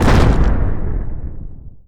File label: Bullet Deck Cannon Hit Structure